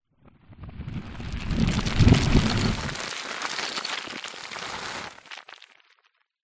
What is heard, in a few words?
fantasy magic wall rocks re pile spell